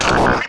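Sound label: forcefield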